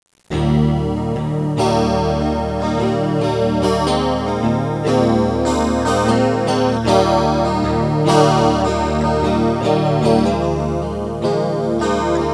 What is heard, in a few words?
Washburn Korg Clean